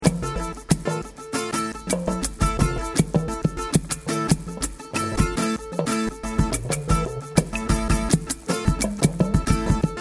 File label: scrambled loop